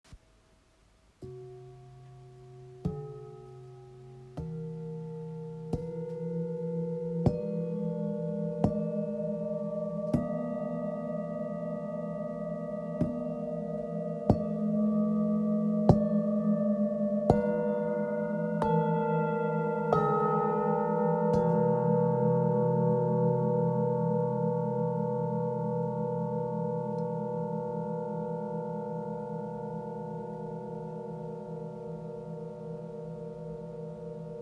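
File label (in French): Set therapeutes, bols chantants tibétains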